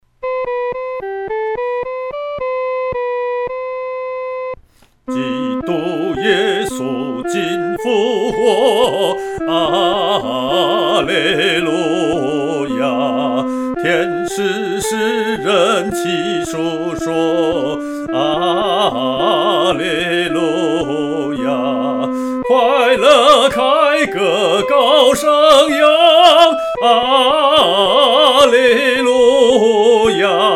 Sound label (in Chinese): 独唱（第一声）; 基督今复活